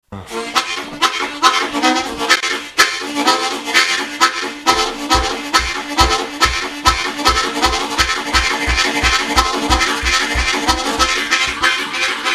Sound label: Railroad, harmonica